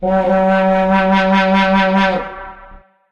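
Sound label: vuvuzela; source engine; tf; football manager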